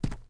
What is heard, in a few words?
thug fs dirt, footsteps